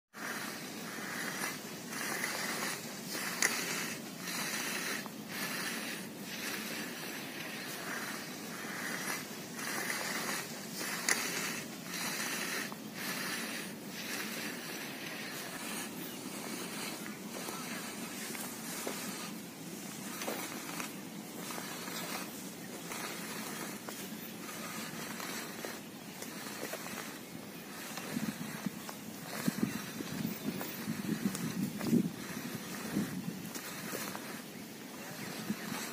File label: plowing soil